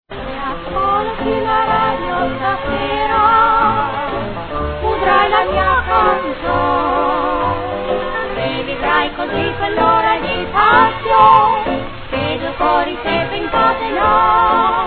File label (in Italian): DUO VOCALE FEMMINILE